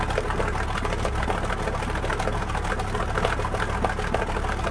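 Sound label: bomber cockpit right, engines; War Thunder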